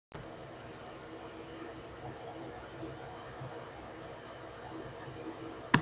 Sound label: no muffle low, 3dprinter fan noise